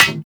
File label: Melee Staff Impact Generic; Warframe; Weapons; Staffs